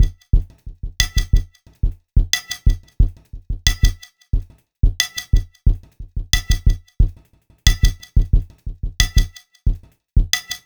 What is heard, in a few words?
Glitched, Processed Percussion